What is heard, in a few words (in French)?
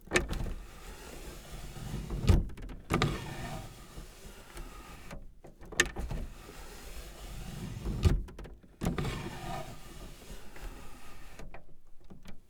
FENETRE OUVERTURE FERMETURE